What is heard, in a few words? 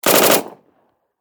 Warfare Submachine Gun Thompson 9mm Short Burst Distant Perspective; Machine Guns